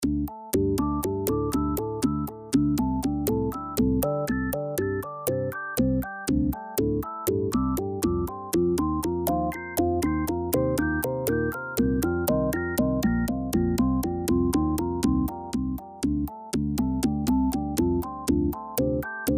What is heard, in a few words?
organ bass loop